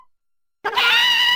Chicken; Bust-a-move

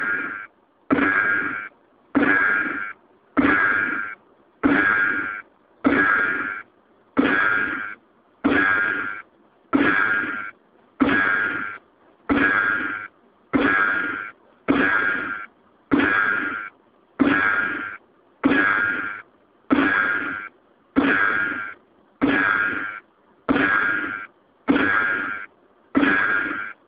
Gunshots

